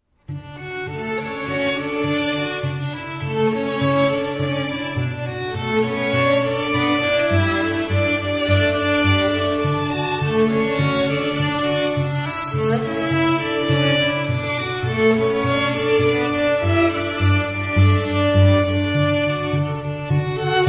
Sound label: Strings